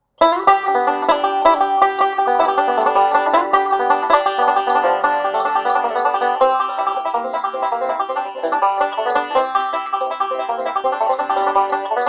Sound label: pick along, banjo